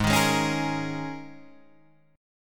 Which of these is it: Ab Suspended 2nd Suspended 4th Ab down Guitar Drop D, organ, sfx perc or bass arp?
Ab Suspended 2nd Suspended 4th Ab down Guitar Drop D